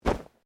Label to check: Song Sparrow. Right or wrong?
Wrong